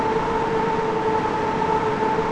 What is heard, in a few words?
Tram